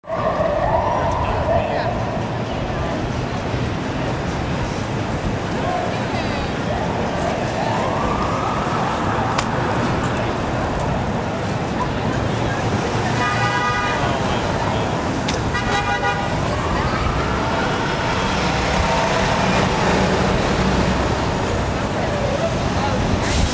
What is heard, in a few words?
traffic